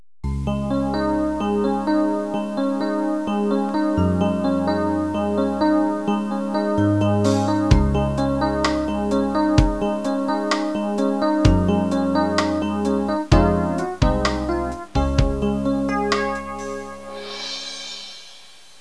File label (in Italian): suonerie businessy